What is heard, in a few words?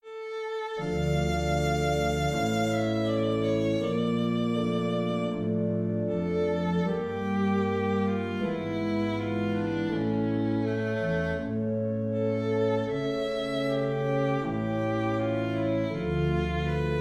Largo d moll; Eccles